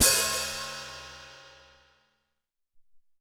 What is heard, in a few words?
Pedal Hat, Alesis SR-18